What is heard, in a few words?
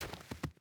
footstep hide snow walk; Rust